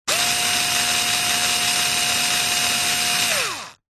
industrial power tool electric screwdriver med burst